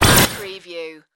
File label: robot malfunction burst